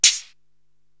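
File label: HH Close, hi hat; Korg TR-Rack; Drum Machines; Defness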